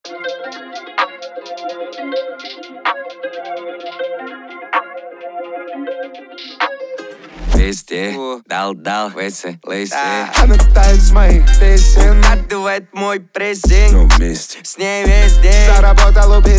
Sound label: 41-46Hz Low Bass